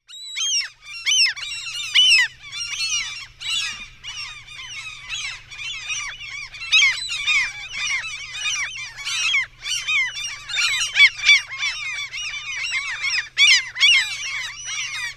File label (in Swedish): Fiskmås